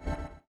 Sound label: Windows Hardware Fail; Glass